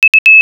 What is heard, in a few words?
beep